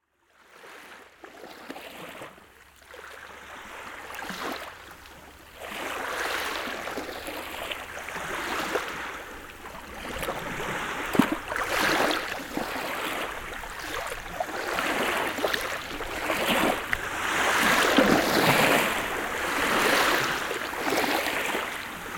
shum morskogo priboja nochju 3d

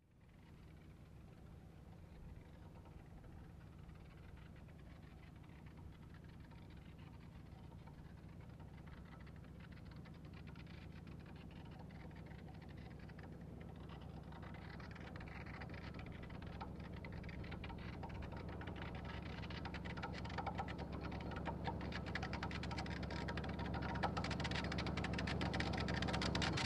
mashina s otpavshim glushitelem

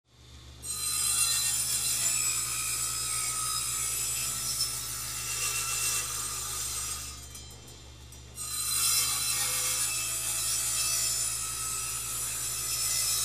rabotajuschaja cirkuljarka

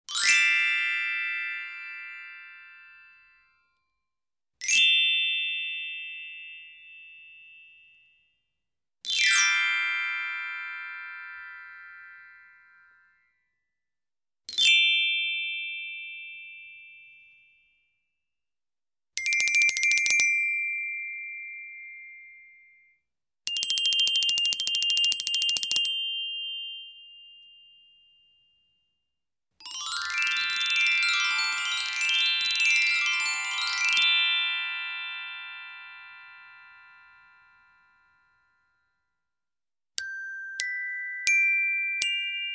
zvuk volshebnogo ksilofona

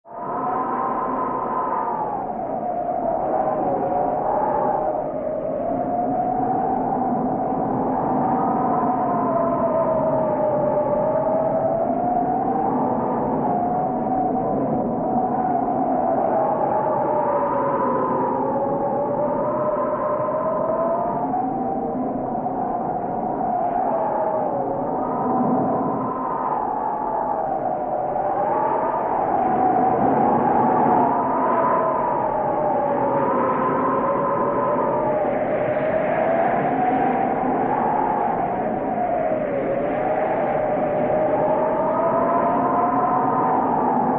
zavyvanija uragannogo vetra

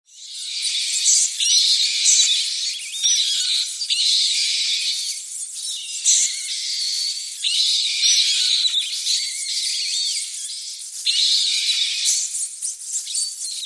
zvuki stai letuchih myshej

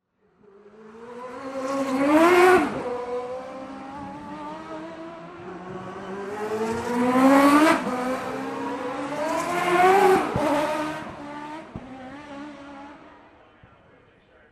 gonki formula 1

